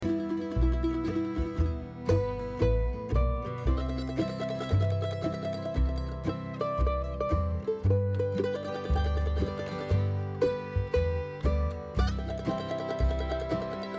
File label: Wayfarin Stranger, Mandolin Hymns